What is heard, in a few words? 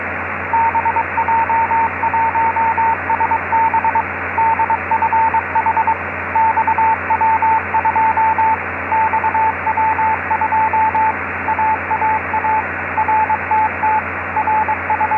cw beacon; xw-2a; 145mhz662